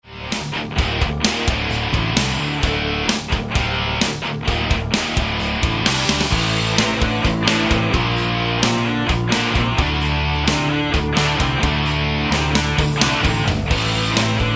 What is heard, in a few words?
metal